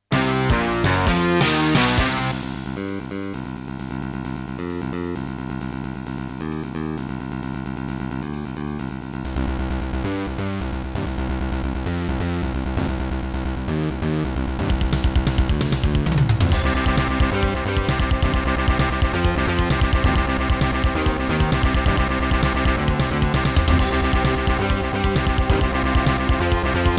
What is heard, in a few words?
Soundfont